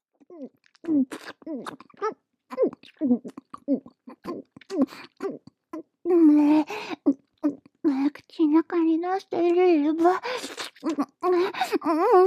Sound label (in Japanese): フェラ→飲ザー→ゲップ